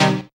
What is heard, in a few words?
HOUSE, House Dance; 90 s Sample CDs; Best Service Dance Mega